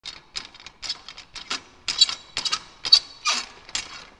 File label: SQUEAKS